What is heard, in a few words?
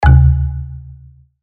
error